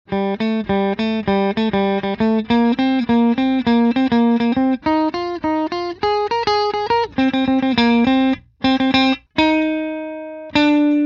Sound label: Guitar; Ninja Turtle